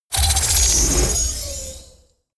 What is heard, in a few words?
sci-fi menu close medium